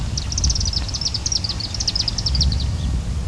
chimney swifts, birds

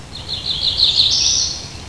cerulean, birds

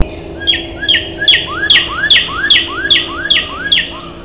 cardinal, birds

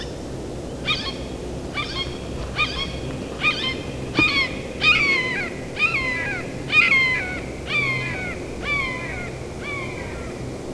red shouldered hawk, birds

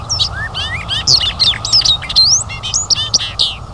bobolink, birds

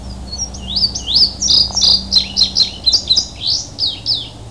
indigo bunting, birds

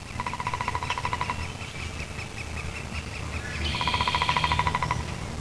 downy woodpecker drum, birds

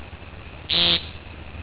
woodcock, birds

